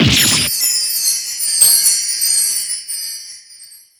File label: damage; amara